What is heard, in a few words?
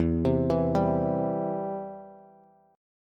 E Minor Sharp 5th E down Bass; Em#5 arpeggiated; JGuitar